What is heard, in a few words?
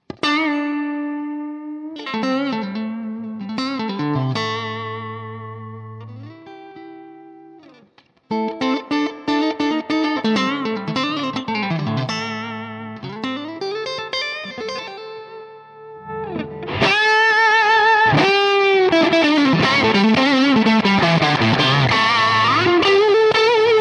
Strat Blues